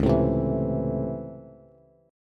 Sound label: Db Minor Major 7th Sharp 5th Db Bass; DbmM7#5 strummed; DbmM7#5 Chord; JGuitar